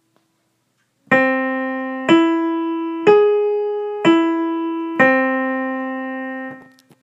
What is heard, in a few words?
augmented triad